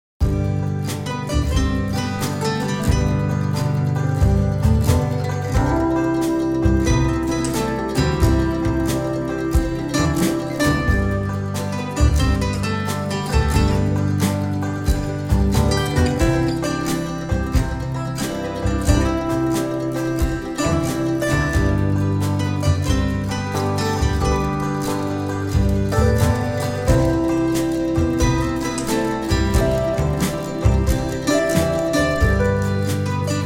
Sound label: Folksy